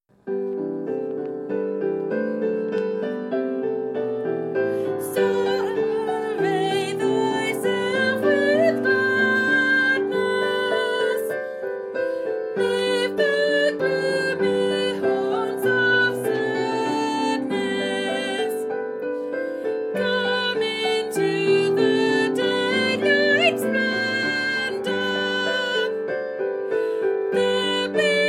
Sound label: Soprano; Soul Array Thyself